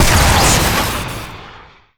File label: Airstrike Crit Sound